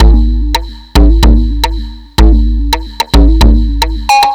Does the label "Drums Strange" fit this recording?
Yes